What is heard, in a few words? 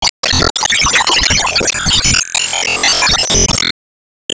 Team Skill Cutin Great